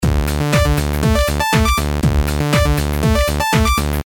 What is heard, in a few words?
glide off